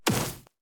npc tree golem little projectile

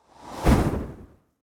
npc oracle strike

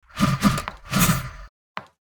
npc strike

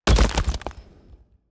heart damage stone

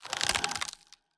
undead ready; Shelter

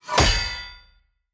sword hit; Shelter

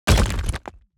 environment room damage stone

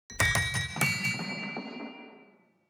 building upgrade stinger

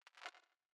zap

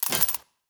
forge armor buy; Shelter

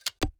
click card close

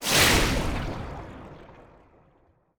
ui player spell water throw

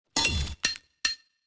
environment successful build stinger

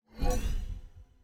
mob spawn

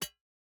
environment pickaxe hit iron

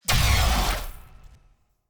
Exhaust Crystal Deactivation; TANK; 3Souls

